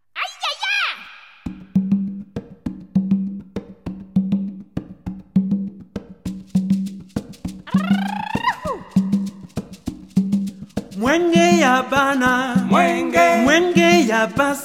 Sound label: Afro Medley